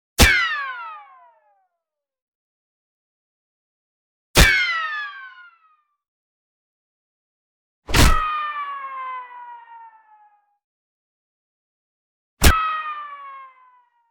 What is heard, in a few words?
Bullet Ricochet Sounds